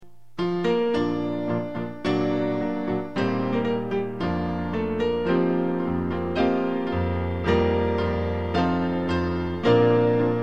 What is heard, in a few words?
piano trio; Power in the Blood